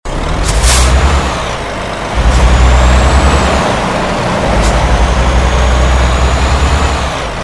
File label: first, vehicles; TDMCarpack; TDMCars